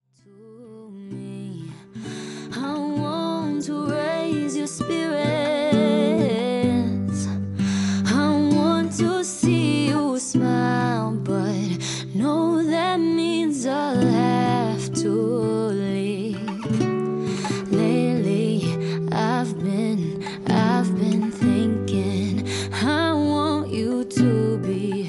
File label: Acoustic Version